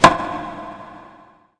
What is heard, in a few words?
shot; VirtuaTennis